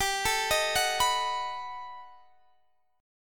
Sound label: B 7th G; B7/G; arpeggiated